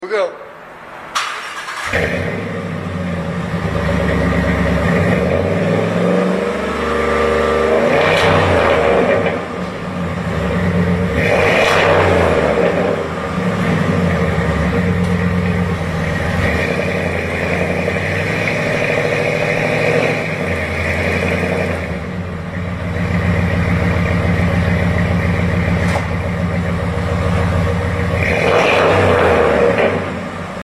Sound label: Dakota Dual